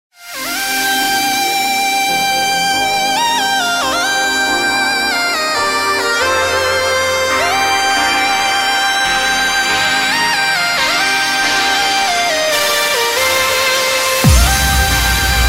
the flute

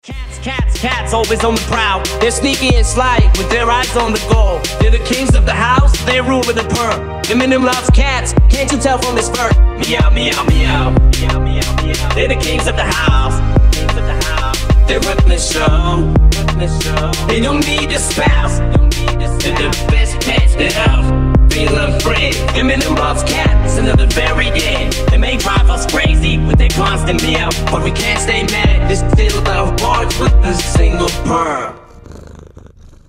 cat rap

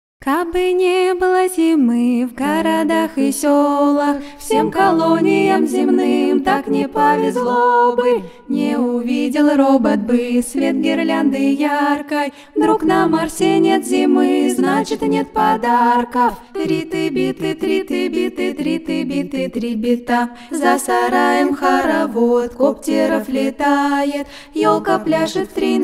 cybercarol song; russian

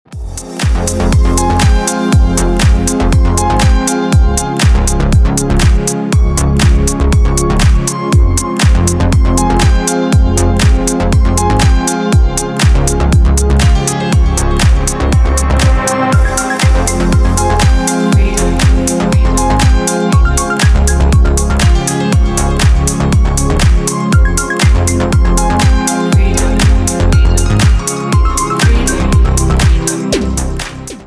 freedom piano

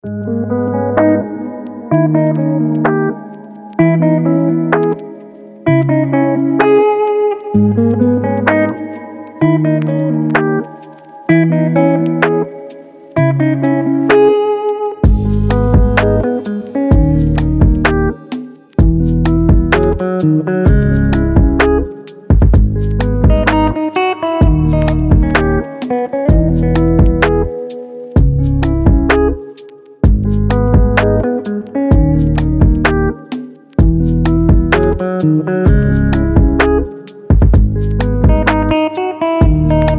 peaceful melody lofi

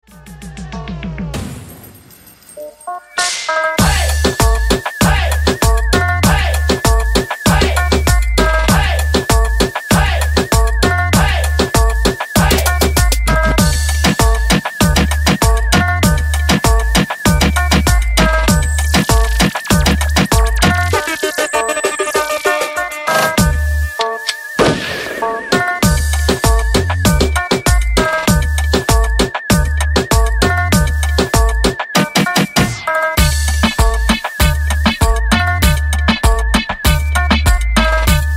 instrumental remake

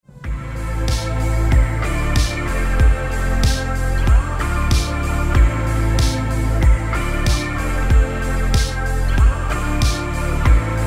softcore instrumental

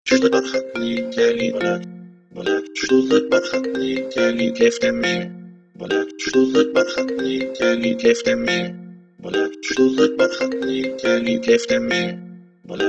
iphone rington

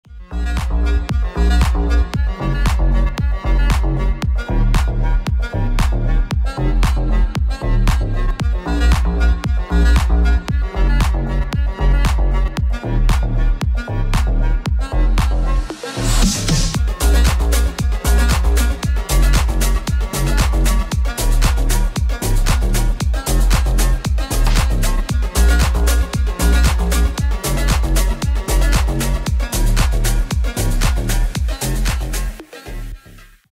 sax original mix

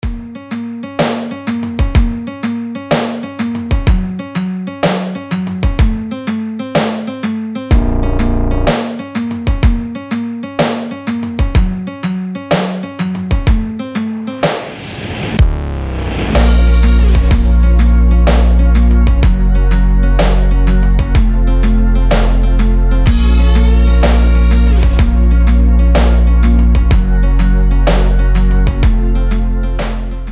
instrumental

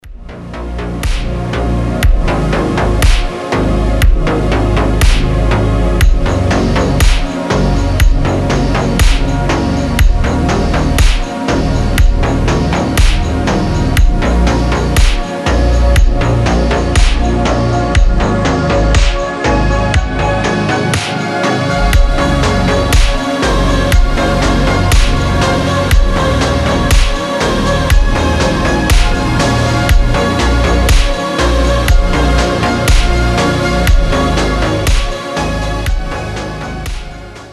over slowed

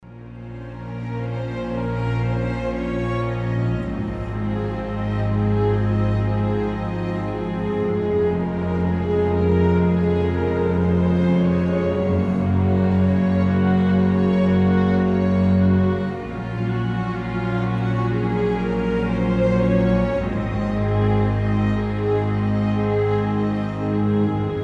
orchestra

